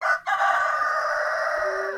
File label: sound machine sample; hof furni